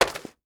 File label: Household Book Soft Cover Dropping On Cement